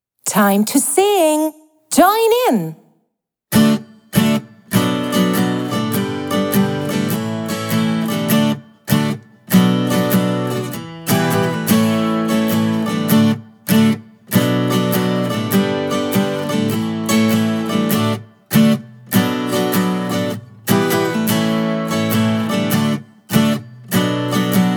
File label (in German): englisch lied karaoke; ich und du